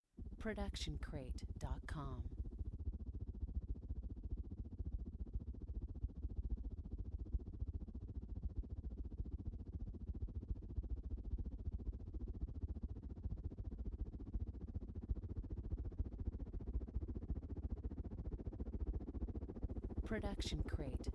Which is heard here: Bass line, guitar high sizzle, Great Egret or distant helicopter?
distant helicopter